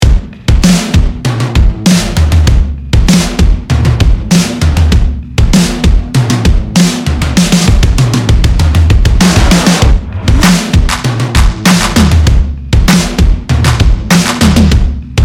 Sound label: HEAVY, drums; chris zane